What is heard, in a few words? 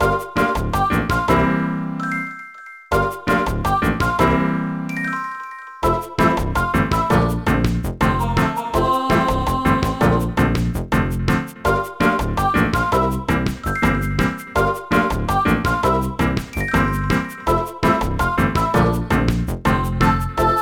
BGM Menu; tomodachi tsukurou mahou no koukan nikki; nds